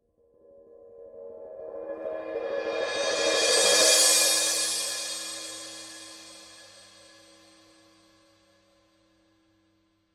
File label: 16 medium roll, paiste 2002